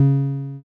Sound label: bass